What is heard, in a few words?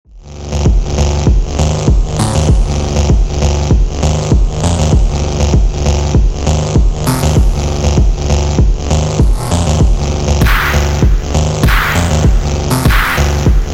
Bass Phonk slowed Reverb